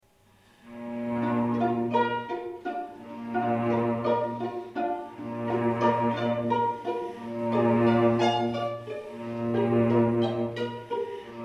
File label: Ave Maria instrumental; Schubert